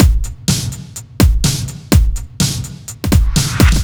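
Metal No percussion, Drum Loops 125bpm, Nu Dance Electro; Zero-G; Soundclash Nu Dance Electro; Acidized Wavs; Tommy Metal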